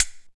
HH, Dance Mix

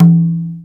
MADAL, ETHNIC PERC; InVision Interactive; Lightware VOL-1

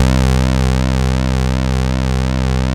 TEISCO, BASSES

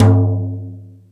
Jazz Drums, E-MU Pro-Cussion

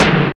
SNARE LO-FI; Roland L-CDX-01; Snares 2; Sn Modules 2

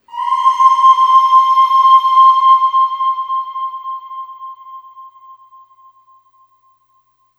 Ooh Short C5 Long Reverb; Harmonic Building Blocks

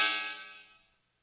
Cymbals, Elektron MachineDrum SPS1 MKII

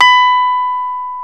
PNO, Rhodes Mk.V; Keyboards of The 60's and 70's

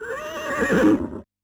HORSE; Yamaha DD-65; SOUNDFX

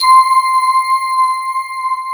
TS 10 GLAS, SYNBELL

